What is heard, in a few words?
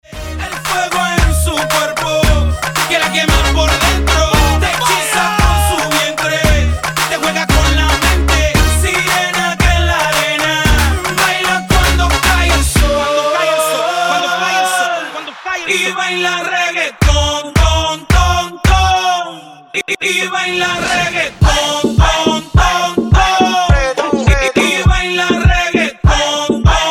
Reggaeton